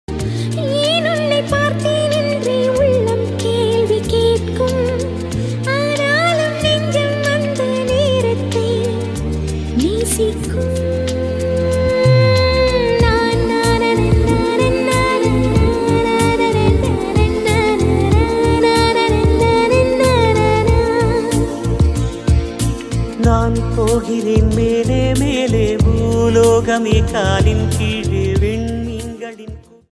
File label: romantic tamil ringtone